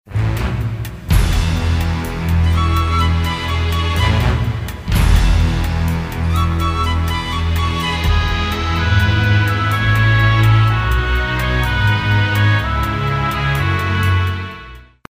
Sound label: musicbed sample, industrial